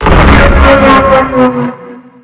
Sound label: boost; lfs; arc170